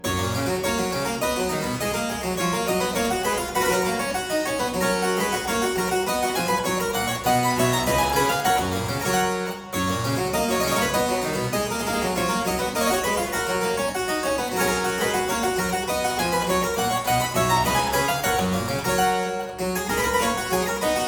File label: Aria in G major Partita 23, Harpsichord; Buxtehude; Complete Harpsichord Music; Aria 'La Capricciosa'; BuxWV250